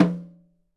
Nosnare; EMU PX-7

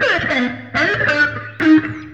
Guit Sqeek Funk; Sonic Foundry (Sony Creative Software); Ugly Remnants VOL-2

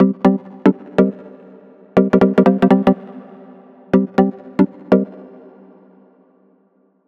122 Cm, Melodic Loops; M8; Electronisounds

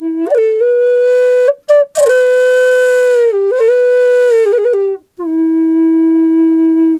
E wild flute

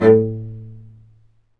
strings low marcato; Roland LCDP13 String Sections; STR Combos 1

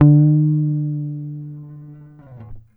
GTR TRIK, Jazzistic; Univers Sons

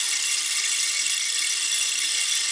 FX Cystals, Drum & Bass; Sonic Foundry (Sony Creative Software); Knutrix Drum & Bass

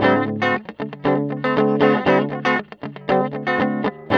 TELE 7TH D#3, guitar; Spectrasonics; Hans Zimmer Guitars VOL-1; TELE7THS

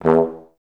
E1, Advanced Orchestra; Best Service; TBS STCP1

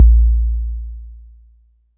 Sub, Yamaha DTXpress Electric Kick

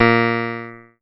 A#, PIANO; Big Fish Audio; Synclav FM

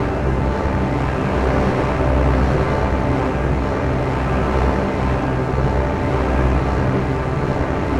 Drone Ambient; Drums & Drones

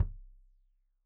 Kik Stereo; Deeply Sampled Drums

